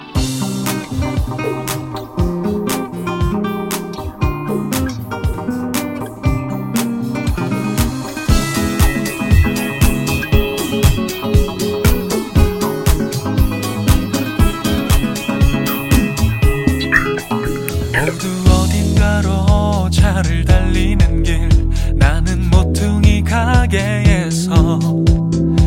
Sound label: instrumental